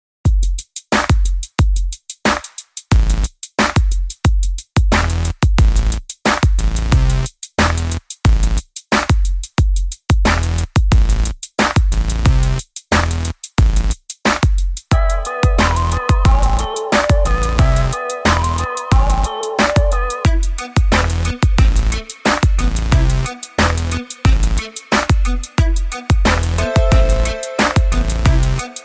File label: Hip Hop